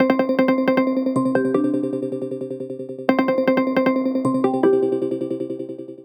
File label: system ringtones abstract